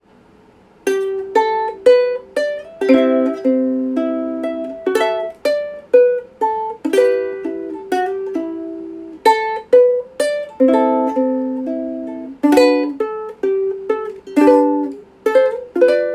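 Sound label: ukulele solo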